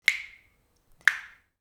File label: water droplets; nature